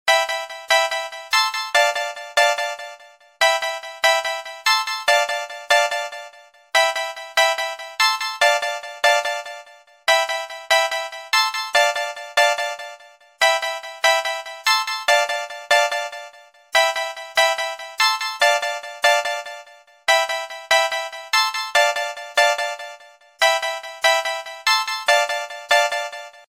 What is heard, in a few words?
nice ringtone